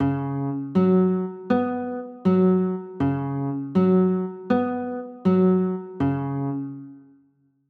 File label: d flat sus4, chords; d flat 7sus4